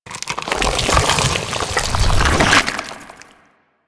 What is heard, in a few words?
Infested Bone Blade Burrow; Warframe